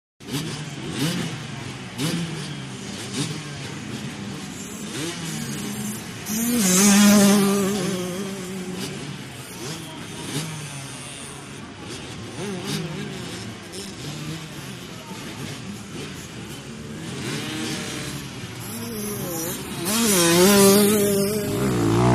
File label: Start Ambience 250 Cc, Bikes Idling, Revving, M / S Bys From The Track In The Background, Shot Cu From The Starting Line.; Motorcycle Motocross